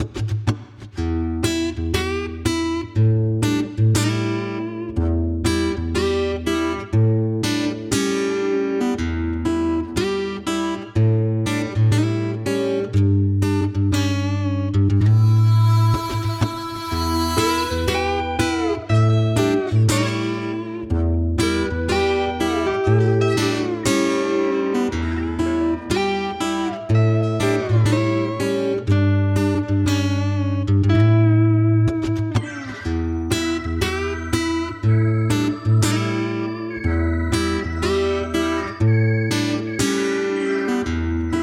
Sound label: menu music